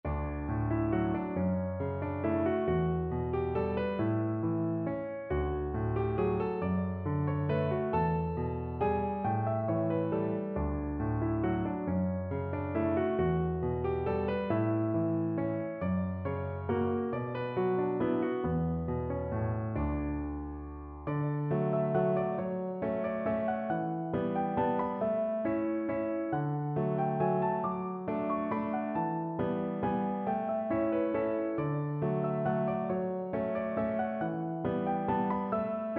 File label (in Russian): мелодия из рекламного ролика; Рафаэлло